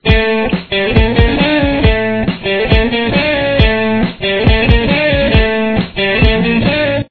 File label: guitar